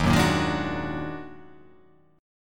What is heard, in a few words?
Eb Minor Major 11th; EbmM11; strummed; JGuitar